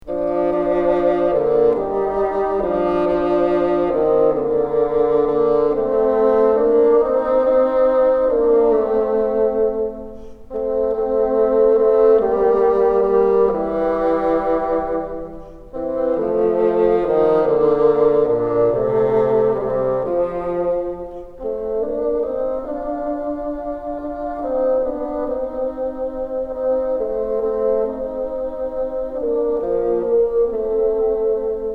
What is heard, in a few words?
together, bassoon